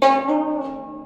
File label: returning portamento